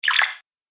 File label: Arcade Game Activate Bleeps; Video Game Sound Effects; Retro Inspired Sound Effects - Think Nintendo, Atari, C64, Etc.; Andrew Fleming